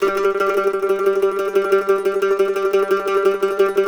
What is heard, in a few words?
MANDO TREM G3, Whole Lotta Country; Sampleheads; Whole Lotta Country 1 & 2